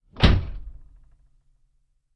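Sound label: Int Door Close, doors; Cutlass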